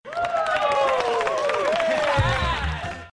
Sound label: Clapping